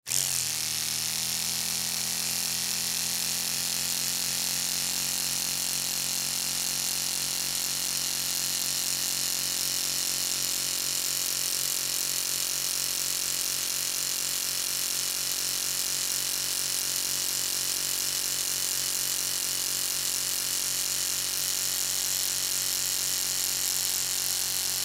Toothbrush Electric, Toothbrush Electric On Run Off; Low Power Appliance; Bathroom; Household; Blastwave